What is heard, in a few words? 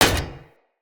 Short Sword Medium Impact Dmg Metal